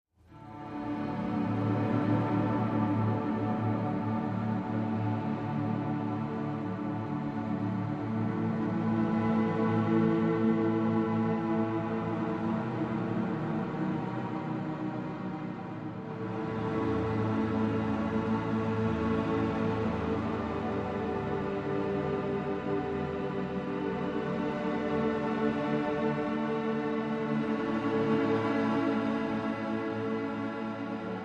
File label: Guitar Pads